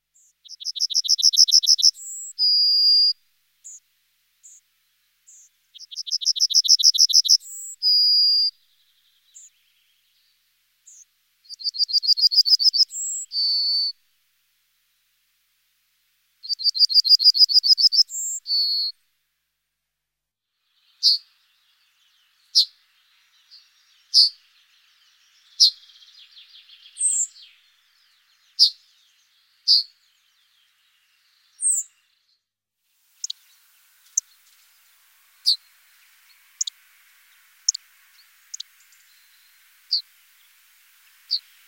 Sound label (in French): Bruant jaune, chant